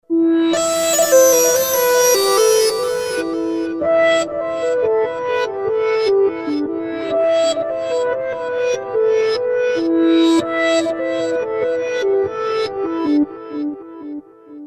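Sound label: synth hip hop; Creamware minimax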